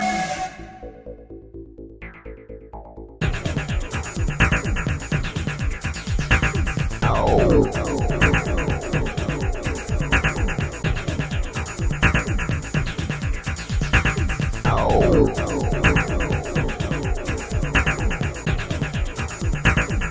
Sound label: Tribal